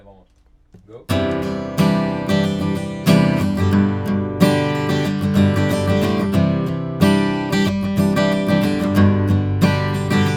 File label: guit eq i o; pendulum; quartet